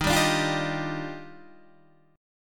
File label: Eb Major 9th, Guitar DADGAD